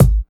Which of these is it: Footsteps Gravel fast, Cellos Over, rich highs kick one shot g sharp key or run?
rich highs kick one shot g sharp key